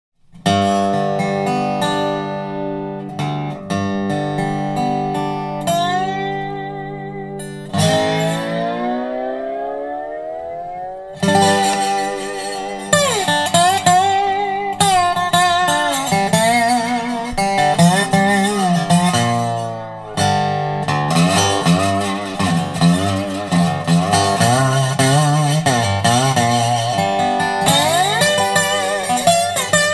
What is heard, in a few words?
Spider, Delta Resonator Guitars